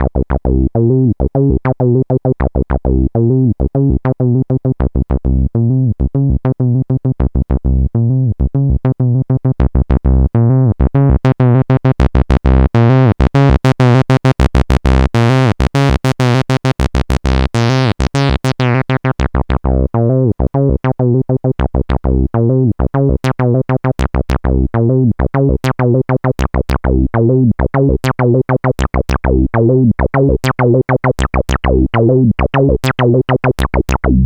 arturia matrixbrute 303 ladder wannabe 100 bpm

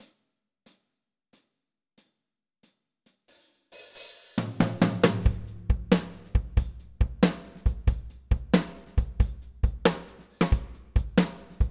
Ayotte classic blues 137 bpm; toontrack superior drummer 3 test

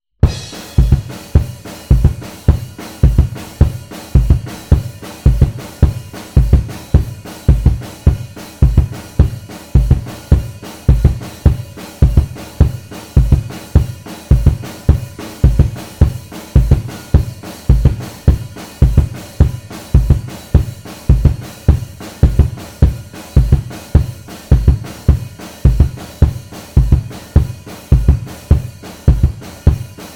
kick drum; rode k2

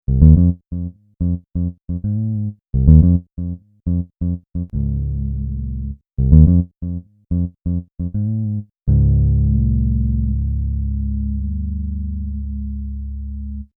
bass performance; eigenharp pico

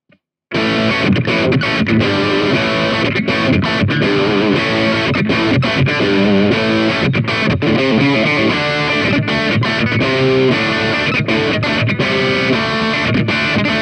hardrock; magix vandal

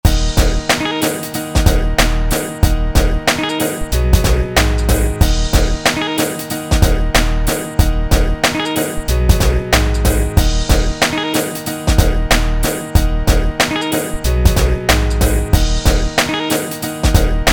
Akai MPC X hip hop

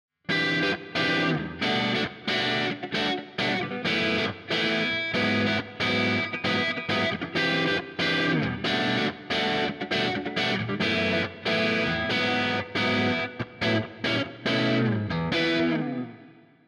harley benton fusion 3 hh roasted ssp; Harley Benton Fusion-III HH Roasted SSP

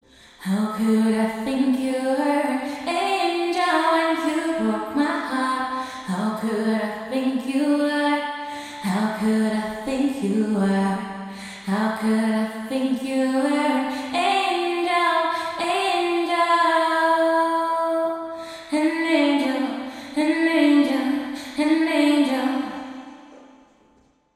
vocals wet; eventide 2016 stereo room

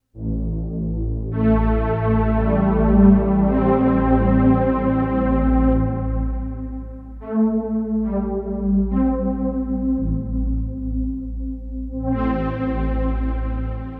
novation peak ana strings; novation peak test

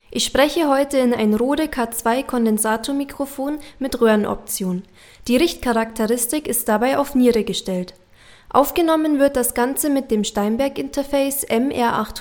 rode k2 vocals

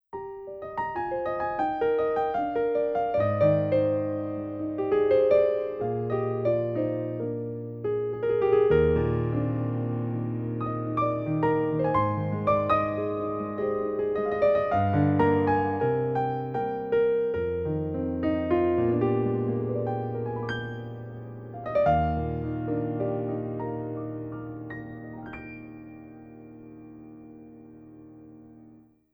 grand piano mellow

